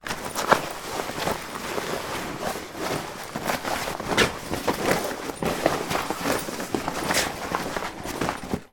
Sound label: foley bags luggage holdals polyester movements; zapsplat